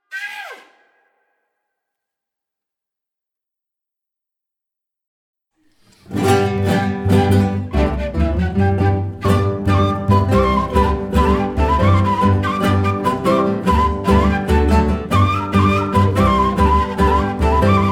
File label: petrunino horo